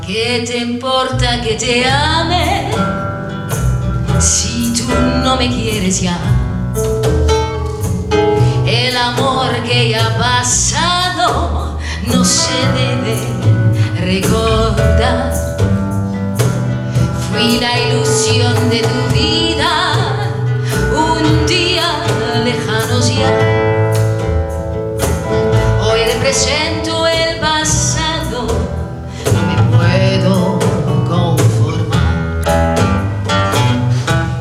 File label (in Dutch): muziek